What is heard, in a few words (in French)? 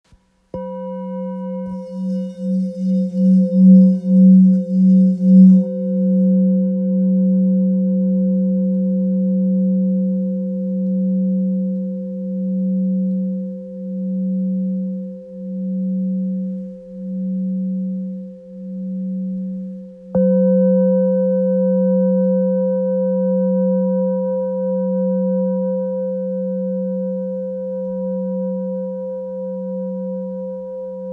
Bol ancien fa diese